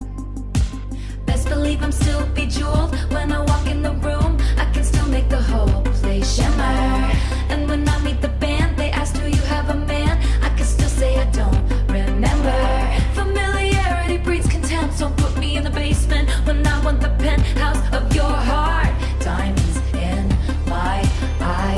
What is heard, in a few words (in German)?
Klingelton; Bejeweled